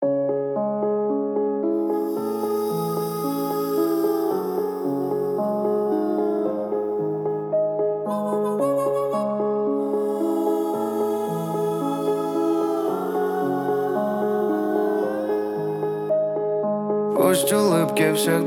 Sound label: 33.35.37hz Low bass